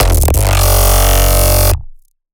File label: bass F sharp